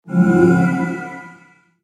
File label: powerup smooth